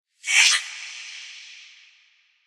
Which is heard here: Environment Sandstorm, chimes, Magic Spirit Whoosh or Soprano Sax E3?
Magic Spirit Whoosh